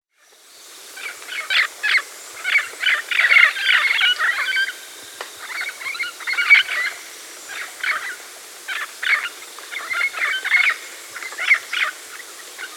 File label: Scaly-Naped Parrot (Amazona Mercenaria), Andean Birds; S. America